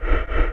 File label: BREATH, VOX; CMP - Analogon